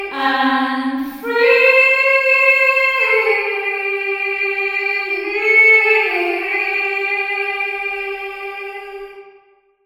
church reverb female vocals

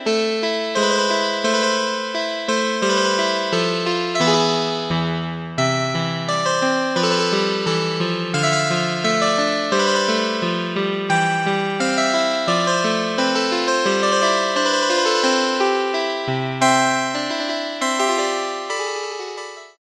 electro-harpsichord

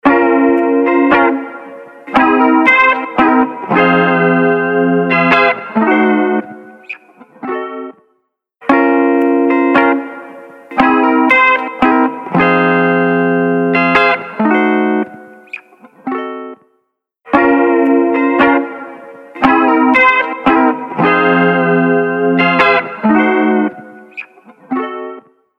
instant flanger mk ii

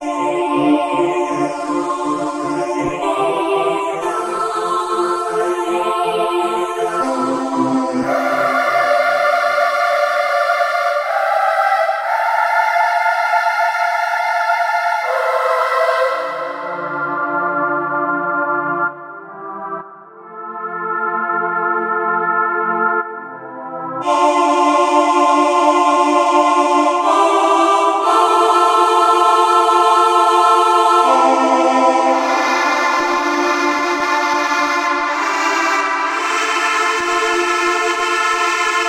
choirs

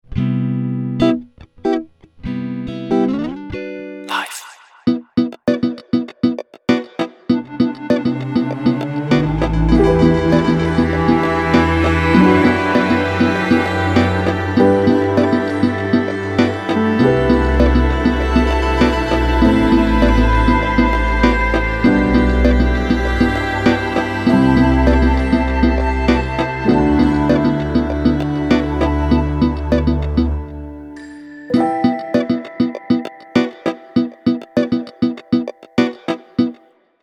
guitar

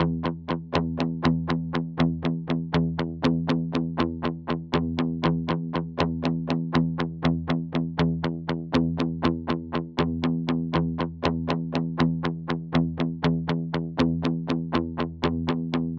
gtr loop E 120 bpm

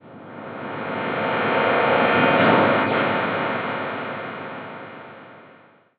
space cymbal

